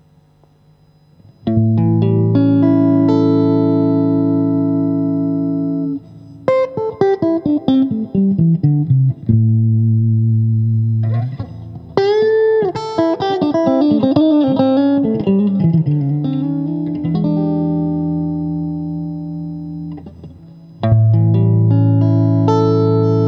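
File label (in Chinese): 60S MWS 美产铜线 清音 3档, acoustic guitar; kindman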